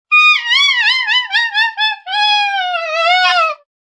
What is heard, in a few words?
Sax Laugh, A saxophone laugh; Funny Musical; Sound Effects:Musical